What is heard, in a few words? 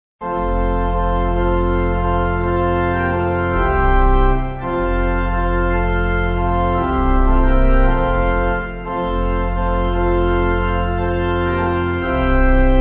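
Pipe, Pipe Organ backing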